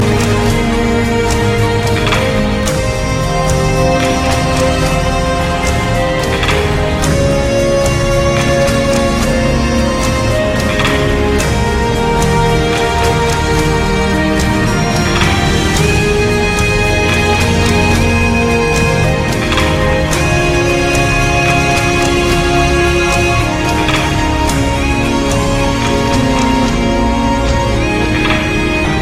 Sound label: irish ringtone